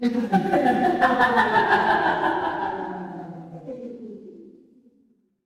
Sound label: crowd laughter